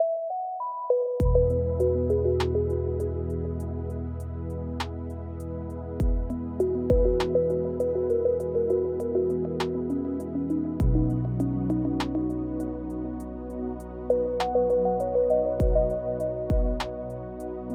music home start

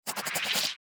eraser

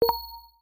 notice common neutral

